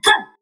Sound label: Stomp